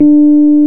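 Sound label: piano Rhodes Mk.V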